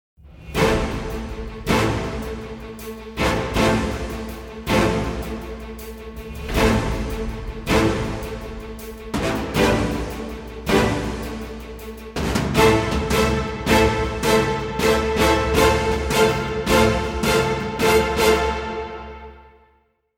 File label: trailer music